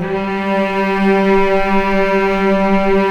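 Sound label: cellos G3, String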